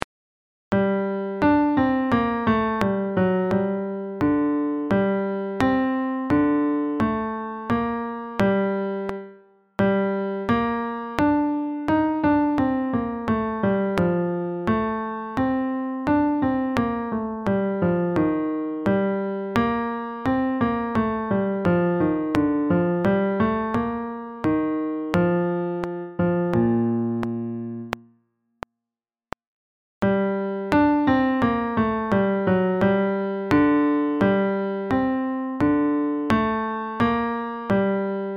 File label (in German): Magnificat Klavier schneller